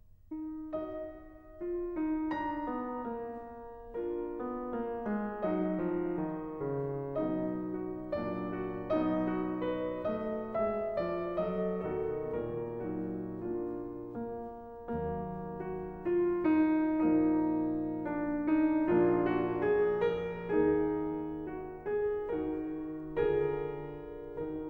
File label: Variations and fugue for piano in E flat major; Beethoven